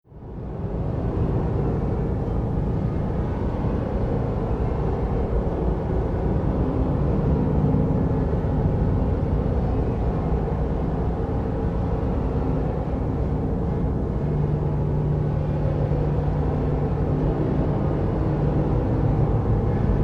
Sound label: Scary Indust; Americn Horror Story Scary Industrial Sound FX Clip